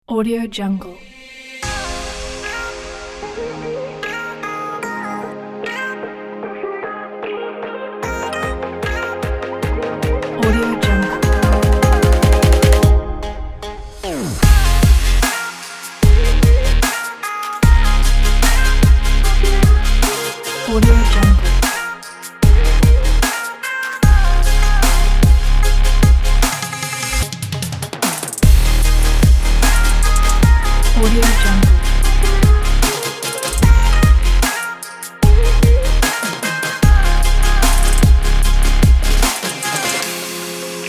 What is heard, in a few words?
future bass